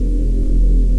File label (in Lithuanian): lazerinis loop; cstrike